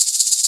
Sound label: Maracas Shake; Digital Sound Factory Virtuoso